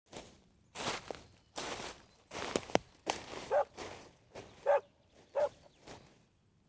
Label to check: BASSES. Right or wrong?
Wrong